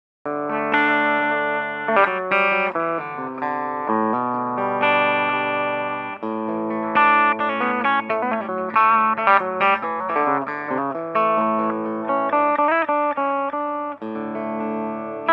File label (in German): steg clean